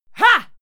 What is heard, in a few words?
female fight attack hit